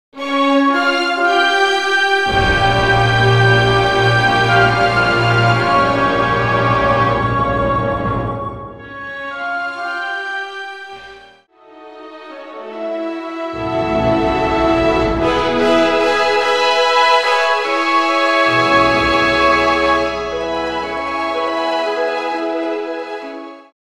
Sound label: Orchestral Intermezzo; Madama Butterfly (Act 3)